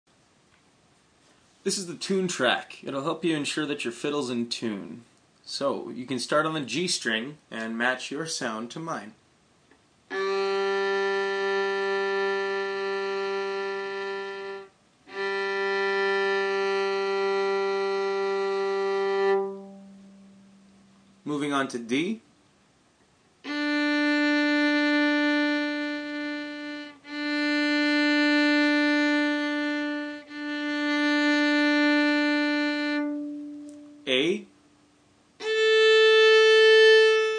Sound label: Violin